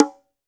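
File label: bongo low open, PERCUSSION; AKAI S6000 CD-ROM - Volume 5; GM PERCUSSION KIT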